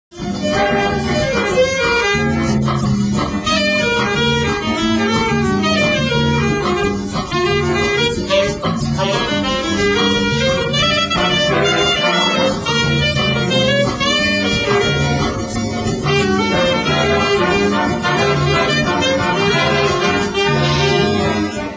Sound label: Alto Sax Solo